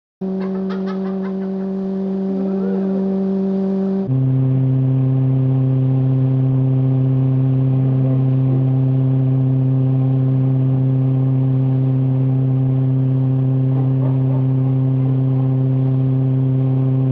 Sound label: fan